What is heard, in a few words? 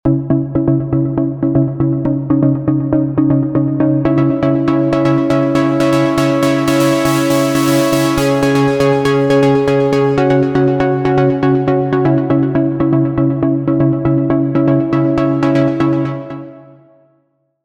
Arpeggia, Melodic and Progressive House, Repro-5; u-he REPRO-5 预设; modulate; DUSZA